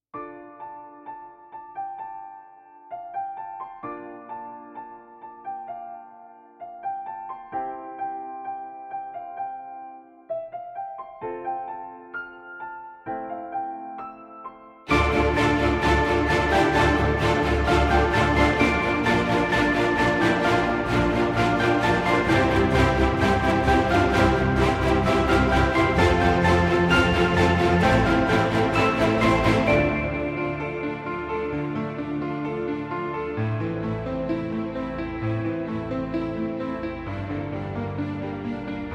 Orchestral

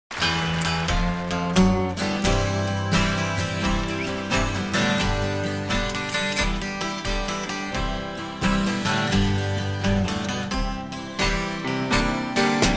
Acoustic, Live

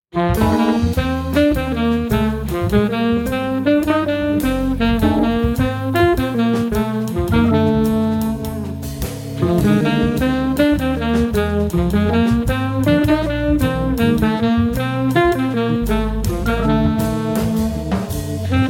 Blues

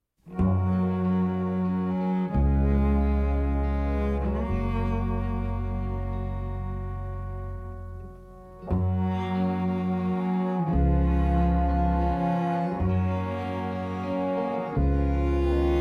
Waltz

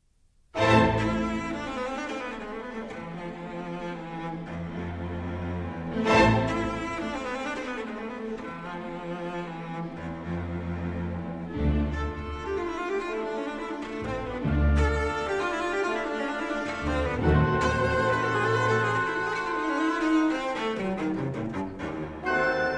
Cello Concerto in A Minor, Allegro Non Troppo; Saint-Saëns; No. 1; Op. 33

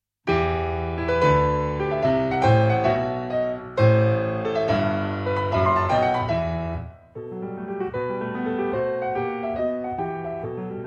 Piano Sonata No. 30 in E Major Prestissimo

